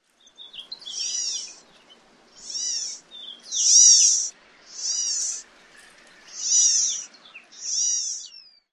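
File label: California Gnatcatcher calls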